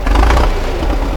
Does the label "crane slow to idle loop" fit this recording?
Yes